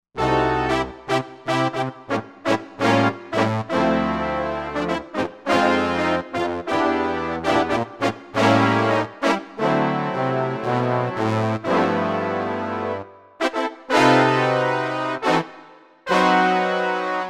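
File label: brass My Big Section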